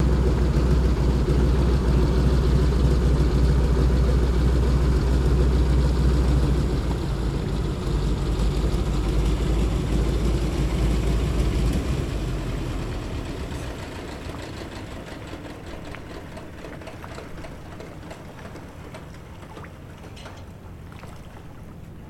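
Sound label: DHC Single Engine Idle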